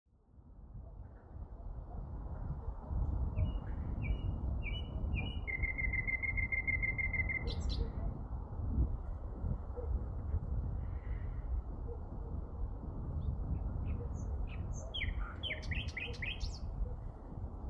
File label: Ambient Night